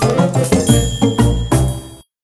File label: over music